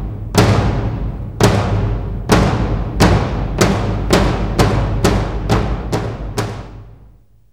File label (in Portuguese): TAMBOR; INSTRUM